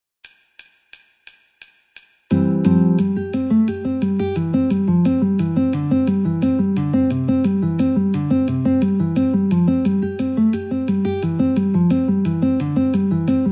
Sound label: Wild Flowers Guitar, metronome 88